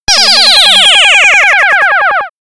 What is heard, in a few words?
futuristic warp zone